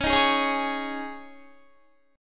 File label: C sharp Suspended 2nd C sharp Guitar, chord; C#sus2; strummed; 21,18,18,18,20,20; 4E,4A,5D,5G,5C,6F